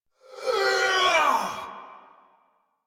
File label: Attack Effort; Warframe; Dax Ashigaru; en